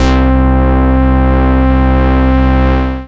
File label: Basses C; Vengeance Samples